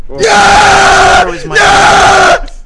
rage quit aaaahh

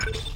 confirm; deadspace

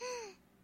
ahh

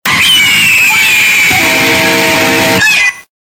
earrape ending for ur presentation

